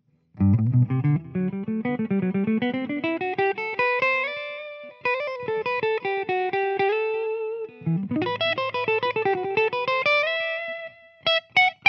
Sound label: neck clean, burstbuckers